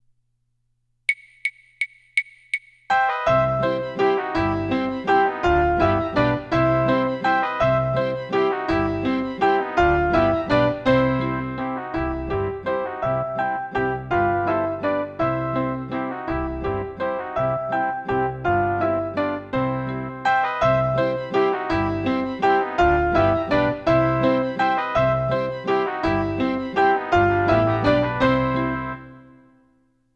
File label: SOLO W ACCOMP